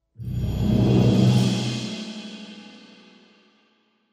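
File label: sinister sound on harp by hand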